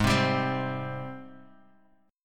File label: G# Major 7th, guitar